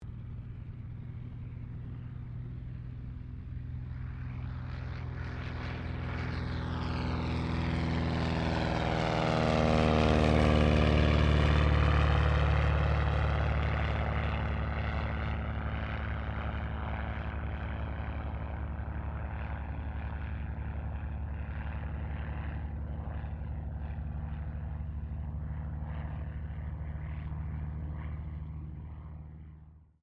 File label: AIRCRAFT CESSNA O-1 BIRDDOG TAKEOFF; Cessna Birddog spotter aircraft; Airplanes; Vehicles